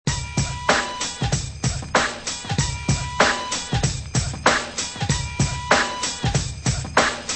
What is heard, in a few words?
drum loops